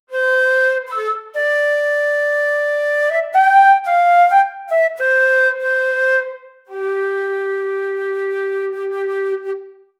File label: egyptian theme played wit, ethnic